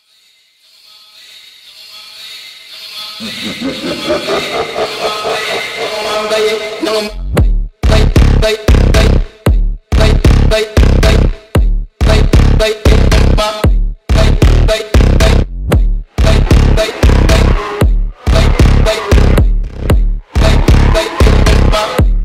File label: brazilian phonk remix